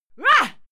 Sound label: female fight attack hit; schreie; menschen